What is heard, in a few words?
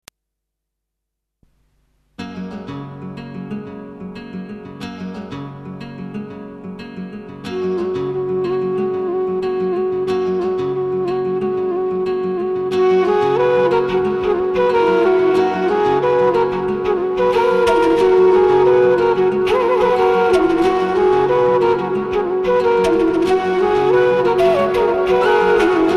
Flute